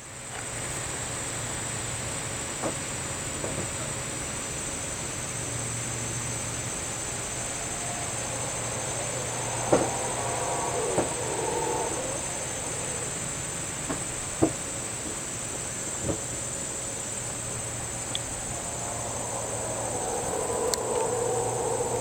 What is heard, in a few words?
howler; Howler monkeys (booing)